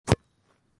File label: soccer ball kick